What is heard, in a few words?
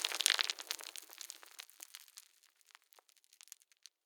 rockfall; dust2